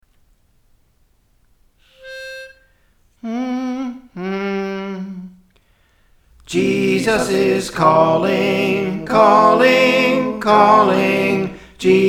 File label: Soprano; Praise For The Lord Training